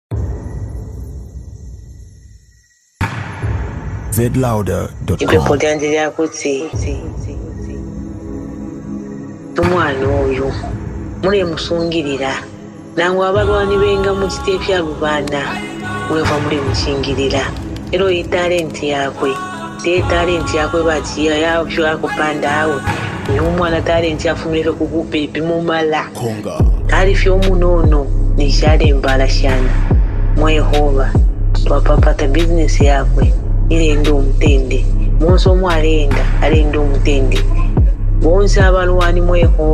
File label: Drums